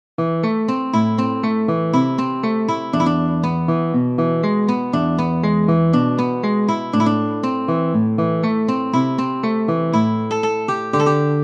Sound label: Tango, guitar